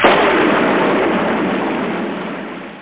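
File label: Lightning; Realmz 5.1.6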